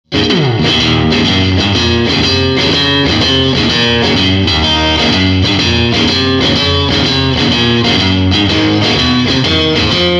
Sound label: fire bottle; fire bottle sounds; effectrode